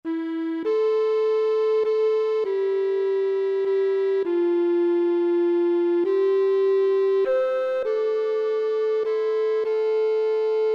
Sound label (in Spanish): flautas soprano; El Cid